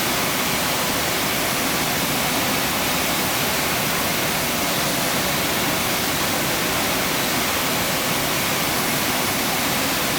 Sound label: Aircrafts F-86 Starter Loop; DCS